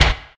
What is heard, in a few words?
backfire damaged, vehicles